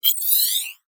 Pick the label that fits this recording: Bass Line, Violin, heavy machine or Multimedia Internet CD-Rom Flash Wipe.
Multimedia Internet CD-Rom Flash Wipe